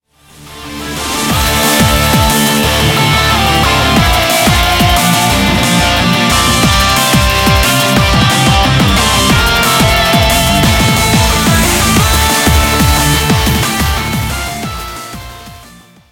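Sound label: background music crossfade; Invaxion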